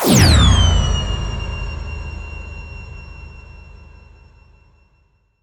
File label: Missile Flyby